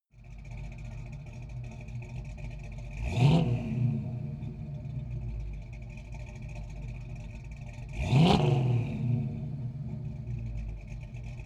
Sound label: car camaro rev exhaust